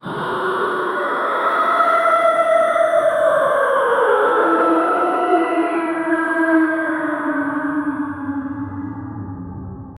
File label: haunted house